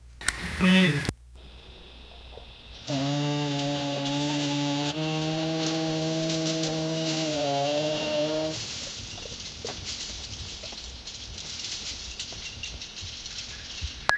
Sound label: horror chase in dark fore